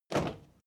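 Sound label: step crowd march normal single right, footsteps; schritte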